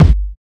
dry bass drum sound g key

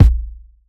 low tone kick drum sound A key

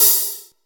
wet open hat one shot g sharp key; open hat samples

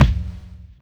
ambient kick c sharp key; Mono

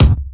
short kick sound c sharp key

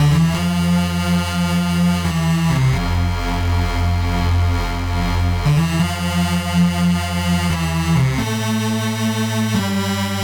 strings, synth; instruments; loops; terminator strings haas

